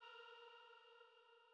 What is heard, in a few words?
Female Pad A#3